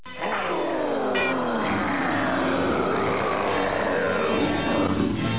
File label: arrrgh